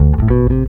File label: bass loop; AMG; Now CD-ROM (Roland)